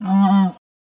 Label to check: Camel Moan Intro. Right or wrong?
Right